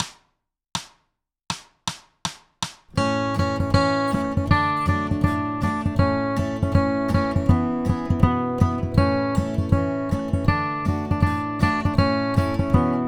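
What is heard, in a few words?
Bluegrass Guitar, simple fiddle tune G; for working out pick direction rules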